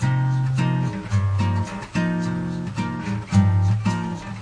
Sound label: guitar; MELODIC LOOPS